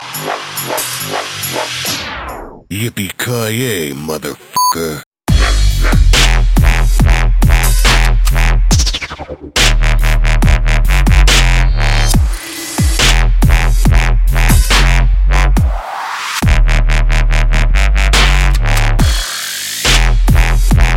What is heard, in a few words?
Dubstep tune